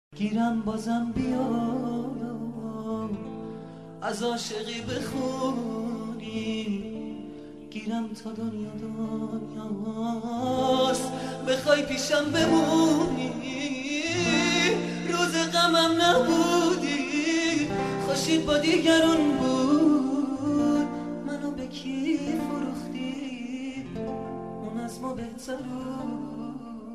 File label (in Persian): Ba Guitar